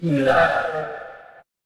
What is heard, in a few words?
feedback error